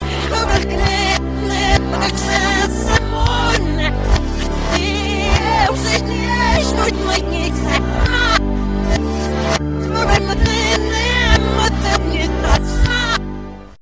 rvrs

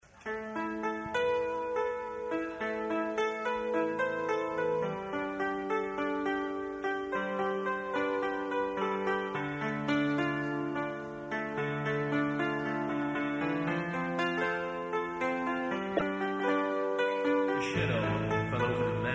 Leaves Laughter

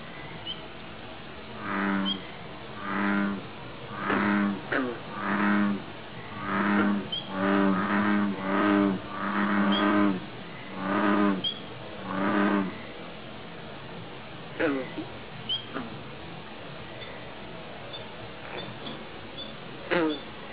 bullfrog

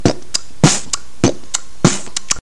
ritmo

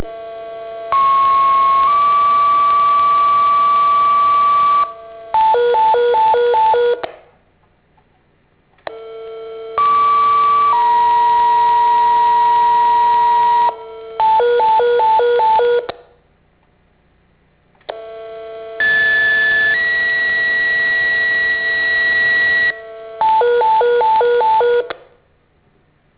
tone all

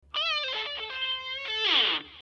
rock guitar

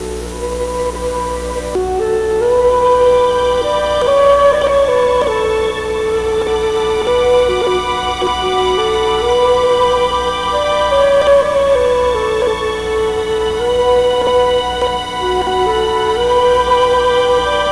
music

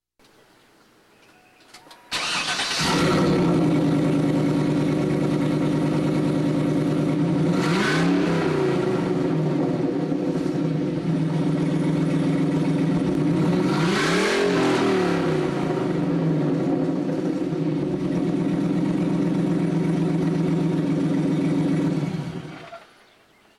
muffle x

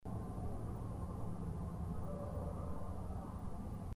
possible owl

